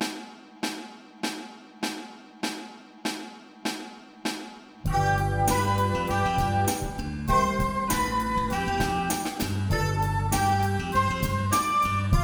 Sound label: Recorder to the Max Learning Speed